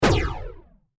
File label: Science Fiction Laser Gun Single Shot